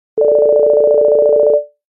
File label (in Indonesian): Nada Dering iPhone 15 Pro Panggilan